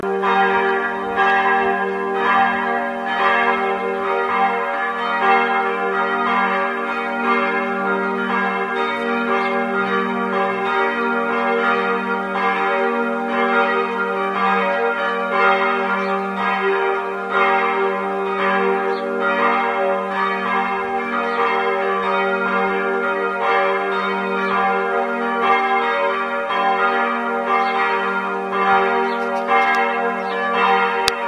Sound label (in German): Das Gelaeut